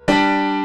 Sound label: example chord